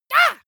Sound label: monster assault high; schreie